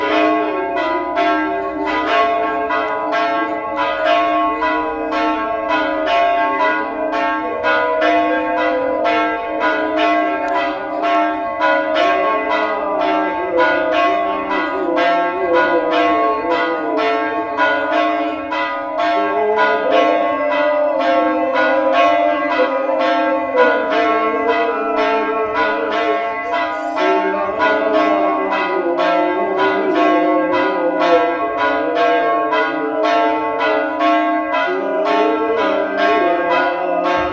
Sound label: church bells sound with priest singing